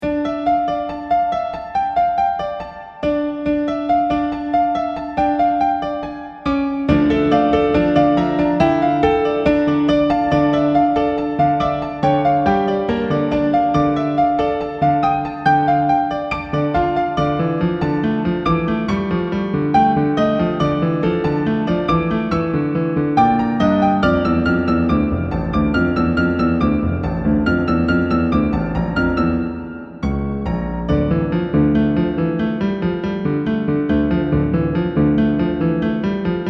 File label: dark chamber piano